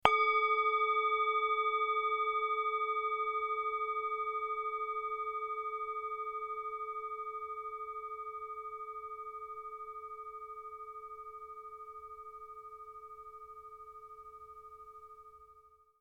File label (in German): klangschale medizin buddha 13cm lederkloeppel; 12 cm